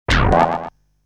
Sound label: Sound that the door opens